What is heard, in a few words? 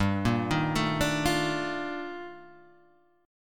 D sharp Major Sharp 11th, G